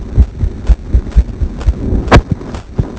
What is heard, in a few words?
lung